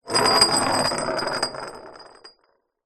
metal bar small roll marble surface; foley

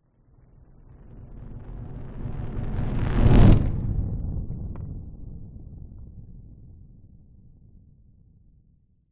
nature fireball pass by large designed

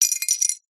bottle beer lid caps metal x3 drop on concrete; foley

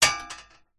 impacts metal hollow frame hit clunk

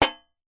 impacts metal tray hit muted hard

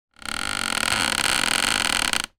office chair creak; zapsplat

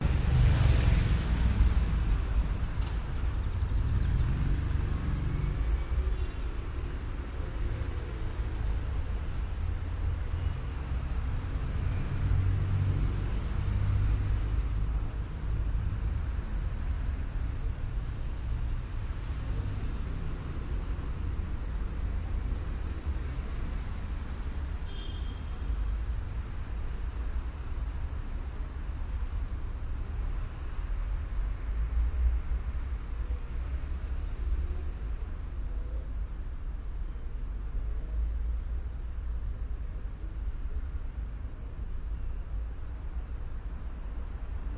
athens side streets weekday evening; tzireon; your free sounds

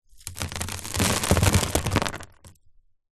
foley dirt drop onto hollow plastic

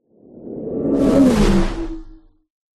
magic spell whoosh powerful energy pass electric

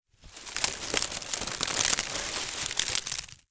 foley palm leaf dry crunch; zapsplat

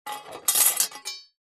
warfare sword small set down on hard surface

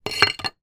axe put down hard ground; industrial

